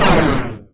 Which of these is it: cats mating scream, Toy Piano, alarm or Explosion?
Explosion